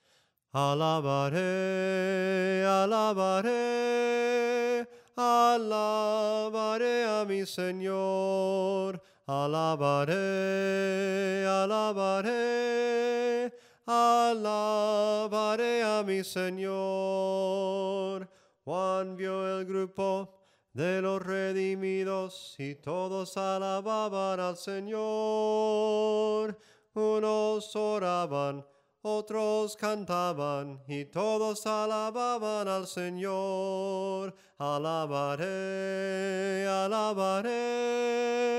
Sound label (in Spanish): SOPRANO CANTADO